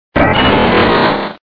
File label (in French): Cri